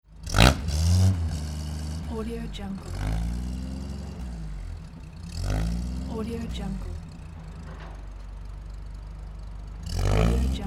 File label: old retro car revving